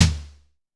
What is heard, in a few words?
Drum X Snare; Pearl Drum-X